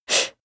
female breath fast in

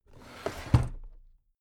drawer cabinet stuff close heavy

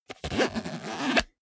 zipper small case close

